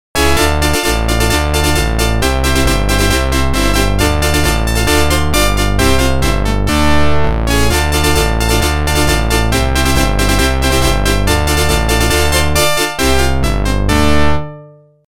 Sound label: Organ